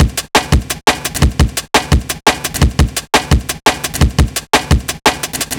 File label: Break Step 172, breakcore breaks